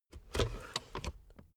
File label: car backseat table fold in